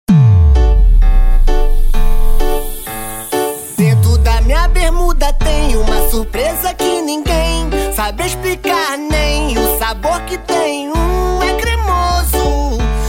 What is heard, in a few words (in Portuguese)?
eletro funk; saveiro impact evolution vol2